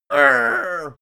goblin hit; schreie

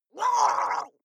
water monster female gargle pain short; koerpergeraeusche; comedy-cartoon; soundpool

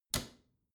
bathroom cabinet close regular; objekte; tueren

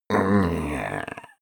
male grumble comfortable; menschen; koerpergeraeusche; soundpool

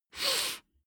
nose sniff male slow; koerpergeraeusche; menschen; soundpool

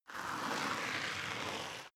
step boots concrete grit slide long; Footsteps; schritte

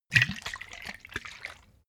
bottle vodka shake single; berufe

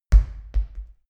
medicine ball bounce, fitnessstudio; sport freizeit

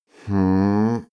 male grumble sad; menschen; koerpergeraeusche; soundpool

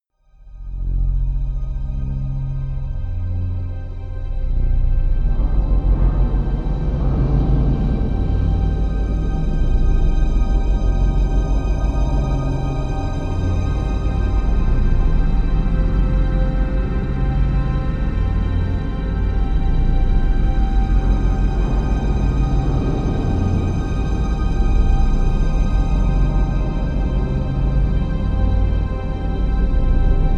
drone dark minor strings

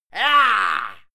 male fight scream heah; soundpool

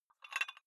dumbbell take off very small weight gentle; sport freizeit; fitnessstudio

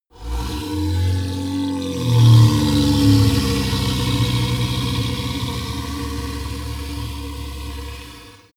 golem growl call; schreie

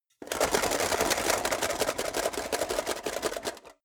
metal box small shake rattle long; soundpool

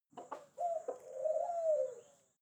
hens and pigeon short; natur-tiere; huehner

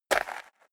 step leather shoes gravel light single right; schritte

